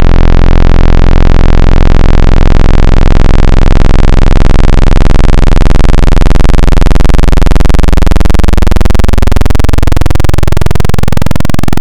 Analog PWM Square, wavetable

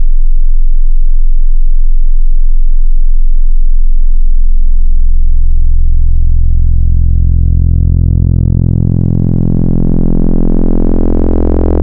Dist Sine Shaper, wavetable

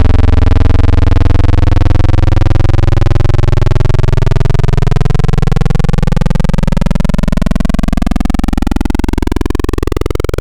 Analog PWM, wavetable

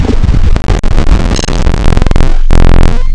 bitreduced; Adventure Kid

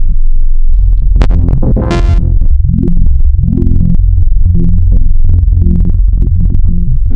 eorgan, wavetable; Adventure Kid

